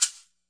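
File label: classic hip hop Shaker; Classic Hip Hop Mega Kit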